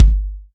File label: Dnb kick